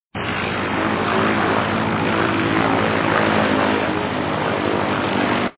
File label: bank Up; doggler vs barron von hare